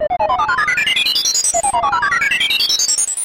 elektronnij zvuk

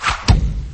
metanie nozhom v cel

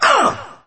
vorchanie

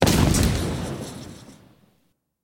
vzriv posle avtokatastrofi

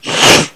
zvuk woosh; horoshij zvuk woosh chtobi dejstvitelno realizovat eto dvizhenie

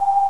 knopka cifrovoi vibriruyushii zvonkii

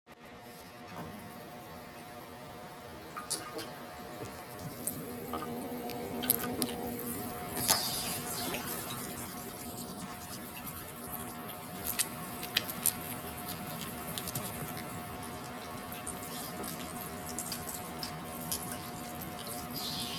elektricheskij ventilyator zhuzhzhit kogda mimo proletayut zhuki

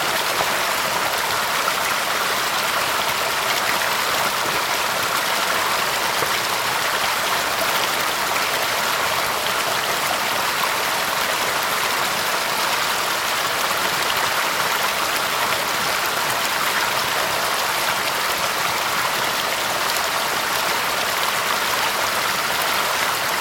moshnim zhivopisnim vodopadom

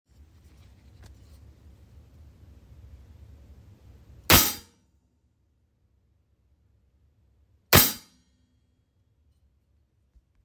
lyazg metalla

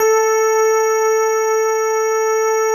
pianino odinochnij kosmicheskij visokij

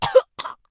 vnezapnij kashel posle togo kak podavilsya

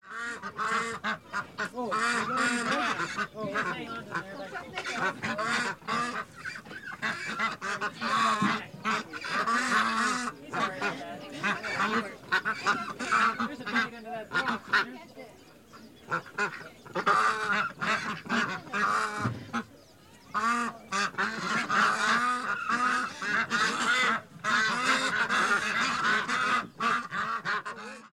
ruini dachnih utok i lebedej

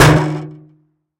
udar palkoj po tonkomu zhelezu

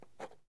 korotkaya bistraya zakoryuchka rospis

